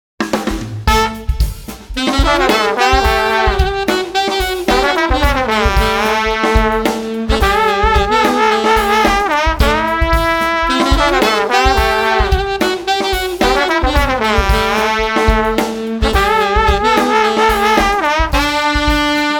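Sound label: Street Sax Trombone Combo Upbeat, 110 bpm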